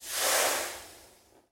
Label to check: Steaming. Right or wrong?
Right